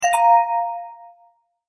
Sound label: multimedia tone start